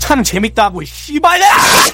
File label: scout laugh evil